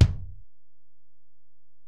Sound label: Ac BD, Alesis DM-PRO; 300 Drum Machines